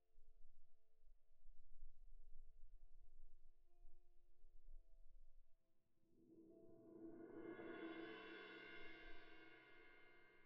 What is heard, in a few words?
Cymb2 Main